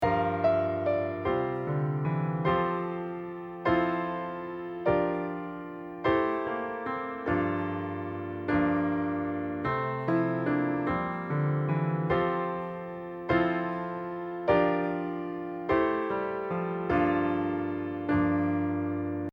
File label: accomp; skye boat song